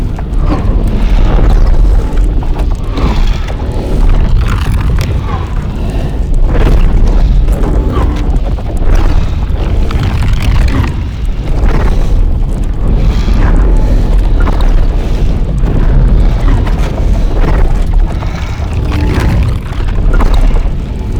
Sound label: Motivator Low Loop; Warframe; Tau; Circles Of Hell